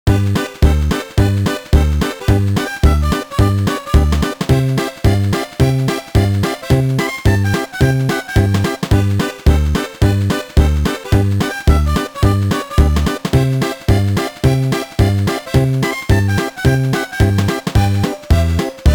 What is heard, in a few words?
Opening Music; SpongeBob SquarePants Revenge of the Flying Dutchman; GBA